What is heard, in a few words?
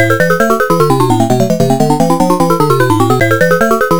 Captured Loop; Galaga